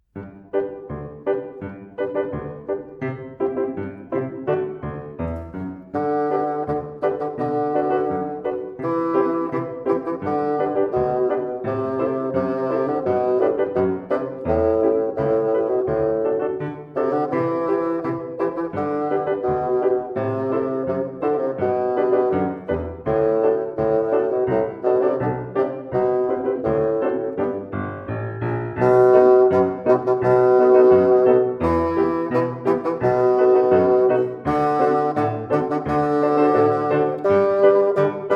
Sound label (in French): fagott march bass